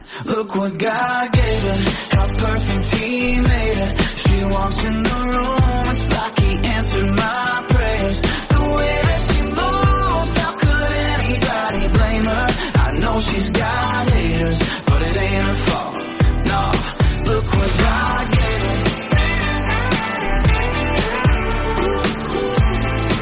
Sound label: jazz music tone ringtone